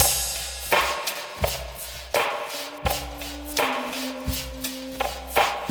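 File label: Gentle Bossa Tip Drums 084, Lounge Drumz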